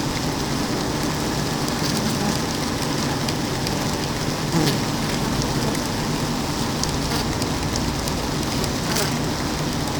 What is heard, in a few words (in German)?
Herrensh. starker Schwarm mono